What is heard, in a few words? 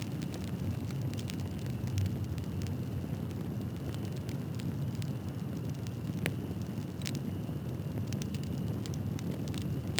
Fire; KaijuSaveEarth